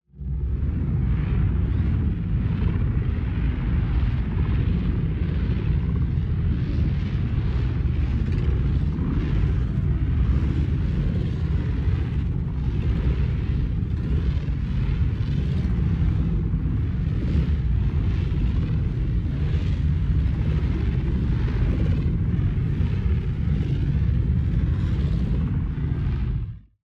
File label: PARTICLES Photonic Vortex; boom library; Matter